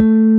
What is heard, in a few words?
bass